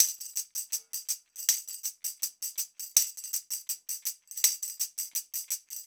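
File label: TAMB, tambourine; Drumdrops In Dub VOL-1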